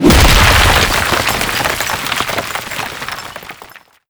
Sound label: destroy, brickwall, destruction